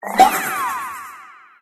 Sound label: Rainbow explosion